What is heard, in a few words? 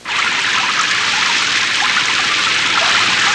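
rain puddle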